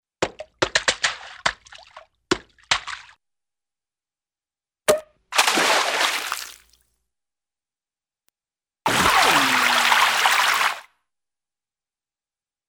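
Shave & A Haircut' Tap Dance with splashes Water Splash, Cartoon; Hollywood Edge; Cartoon Trax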